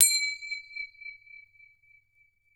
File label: SPINNIN, BELLS CHIMES; Voice Crystal - Steve Reid Definitive Percussion